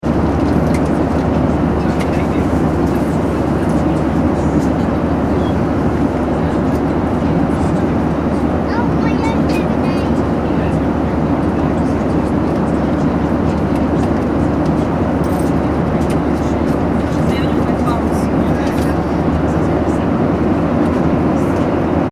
transportation aircraft commercial boeing 737 800 interior, In-Flight Ambience; Vehicles; Airplanes; McKinneySound